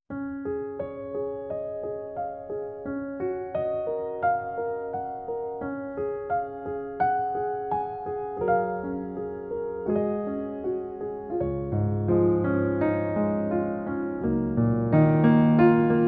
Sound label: Music Only